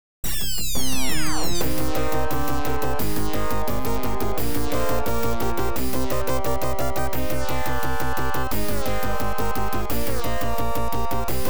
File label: Intro, Ad Lib; F-19 Stealth Fighter